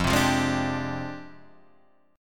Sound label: F Minor 6th Add 9th F down Guitar Half Step Down